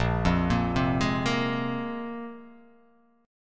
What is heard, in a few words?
Bb 7th Suspended 2nd Bb down, chord; Bb7sus2; arpeggiated; JGuitar